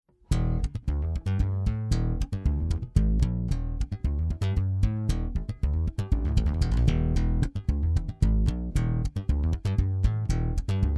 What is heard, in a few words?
Godin Fretless XLR in Millennia HV-35